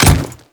Default Step, Footsteps; Enemies; Warframe; Grineer Royal Guard

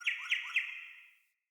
Cardinal Male Bird Song

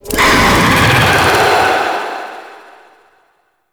Dash; Warframe; Stalker Assassin

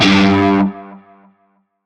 Shawzin Oct One G; Music; Warframe; Narmer Shawzin

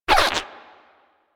Mine Osprey Idle, Dialog

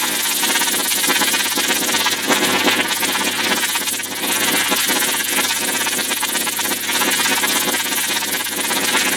Electrical Generator Loop; Ambience; Warframe; Orokin

